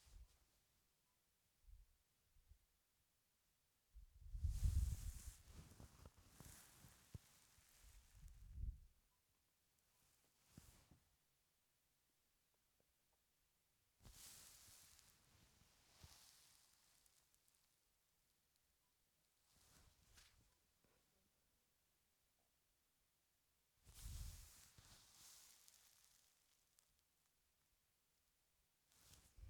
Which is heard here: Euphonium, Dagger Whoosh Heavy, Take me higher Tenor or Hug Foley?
Hug Foley